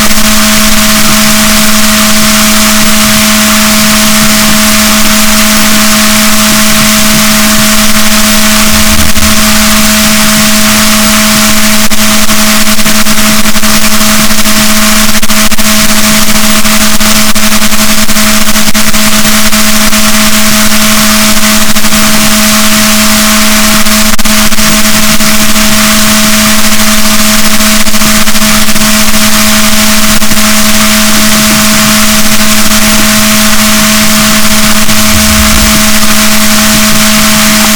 rorquals positif, gaussian noise, BalaenopteraPhysalus Chile